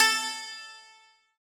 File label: Santoor A3